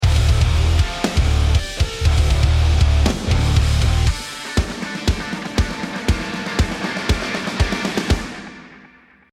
round mvp anthem

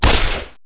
fire 9mm

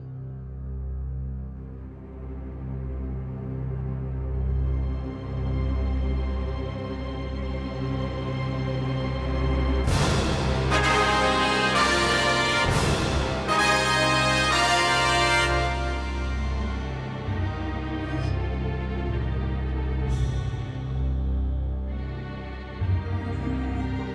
Cinematic Music; Absolute Power

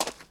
Foot Gravel Shoes Walk; Lucius III

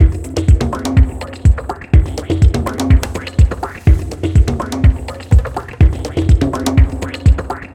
Beat; Fortnite Save the World

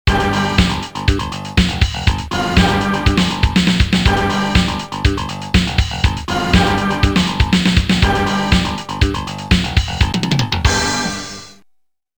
Score Count; Capcom Belt Action Collection

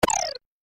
Deactivate

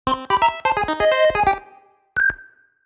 Lose Life; Kirby; Classic Music Collection

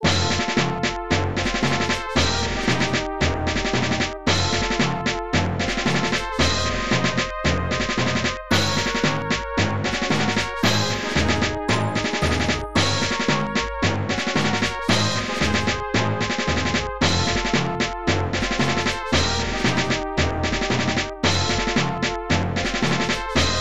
All Clear (Game Clear); arcade

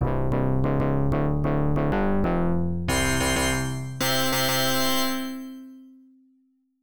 Round II Clear; Tecmo Knight; arcade

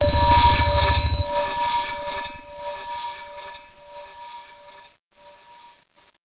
Electronics; Learn to Fly 3

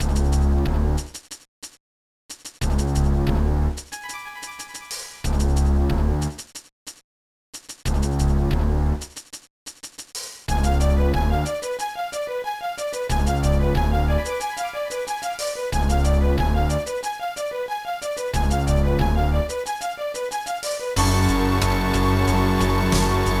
BGM; Shin Megami Tensei Devil Children Koori no Sho; GBA

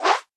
UI Toast; Taps to Riches; Android

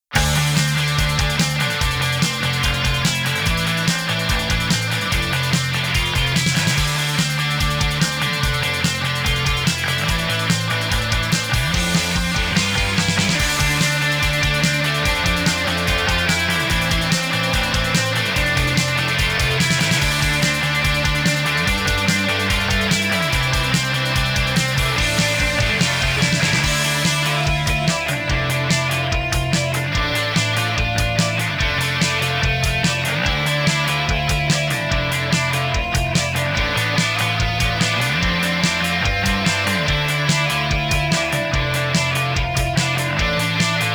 Radio Rock; Gangstar West Coast Hustle; Android

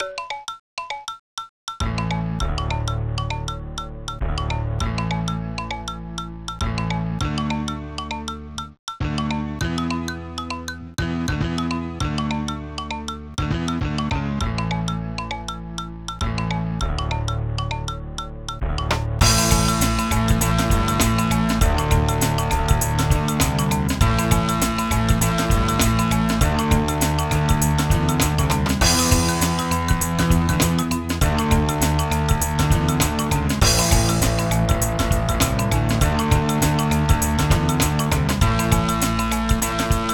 Hi Score Mellow; humans meet the ancestors the 2009 nds gamerip

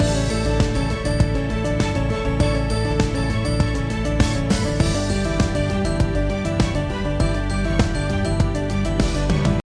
Loops; Odesong

